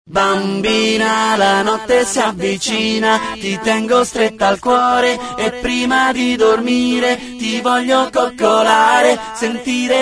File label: acapella